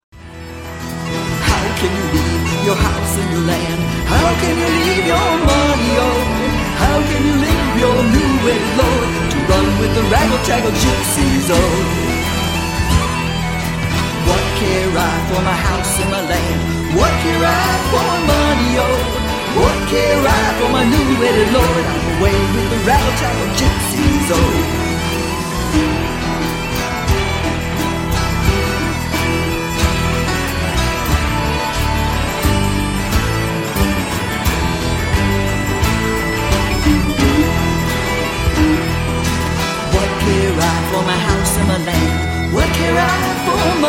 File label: Bagpipes